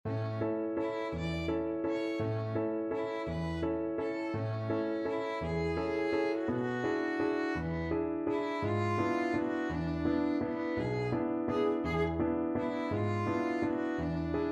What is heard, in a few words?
rosen aus den suden violin